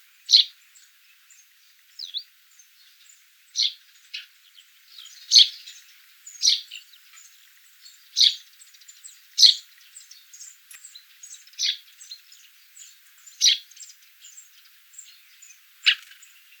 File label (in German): Vogelstimme Feldsperling Passer montanus call; Marco Dragonetti